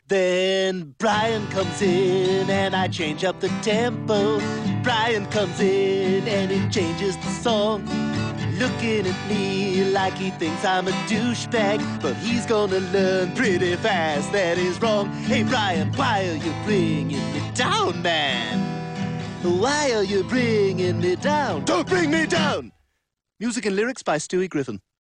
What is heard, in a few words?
guitar; stewies